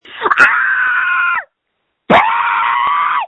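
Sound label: Scream, phone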